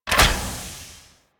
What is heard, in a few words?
Ship Kiosk Pillar Start; Warframe; Corpus Ship Remaster